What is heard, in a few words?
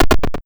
helicopter move; tanks of freedom; units